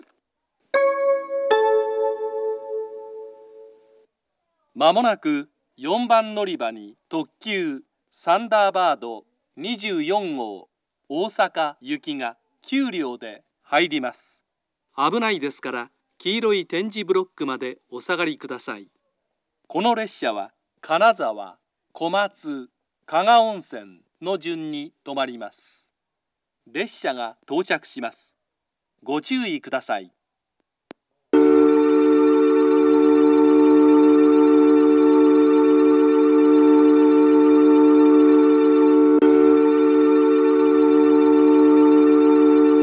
takaoka limited express thunderbird osaka, station; 高岡駅 4番線; 接近放送; 特急; 大阪; 特急サンダーバード24号 大阪行 9両編成です。; 途中、金沢、小松、加賀温泉の順に止まります。; jr-west